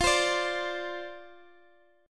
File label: F 5th 6th F, chord; F56 strummed